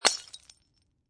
Glass Bottle Drop Empty Break, Impact, Glass Beer Bottle, Empty, Break On Cement